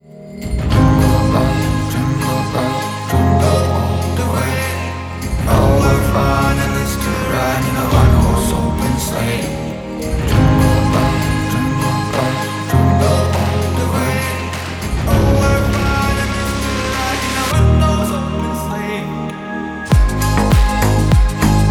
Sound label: jingle bells